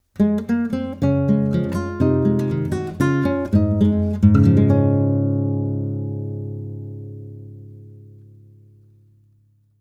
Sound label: Jingle; ClassicalPedia